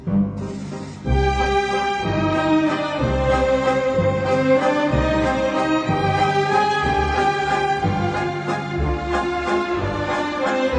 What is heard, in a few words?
waltz; nchicago